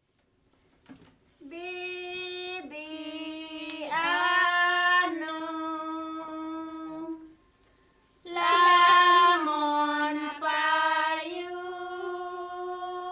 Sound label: gending rare; Asak; Bibi Anu; 1972